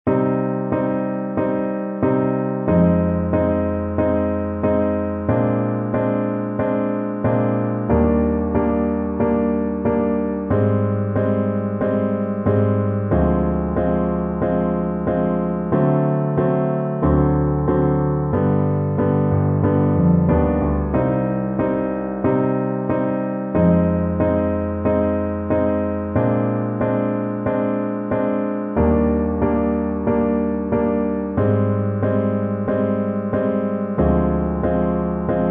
Ave Maria (Viola Solo

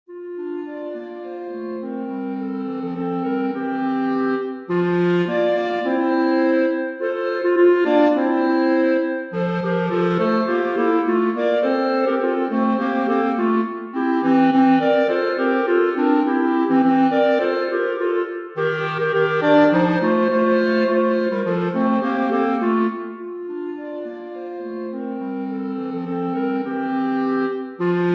clarinet trio

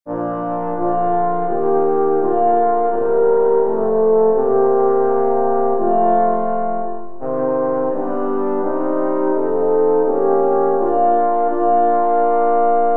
horn quartet

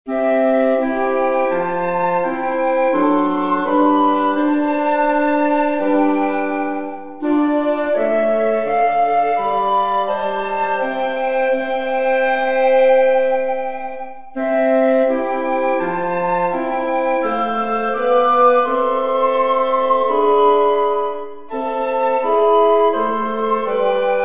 woodwind quartet

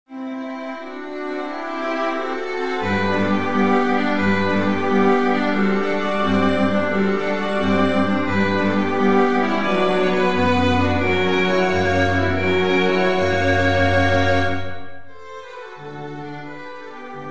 string quartet

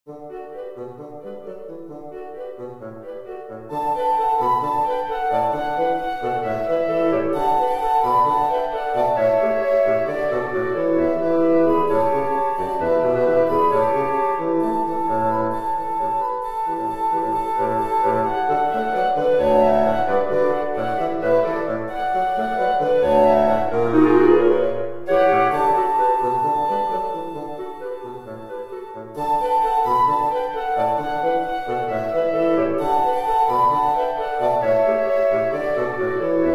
woodwind quartet

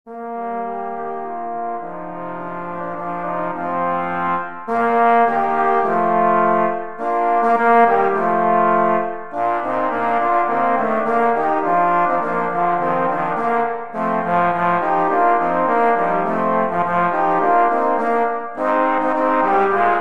trombone trio

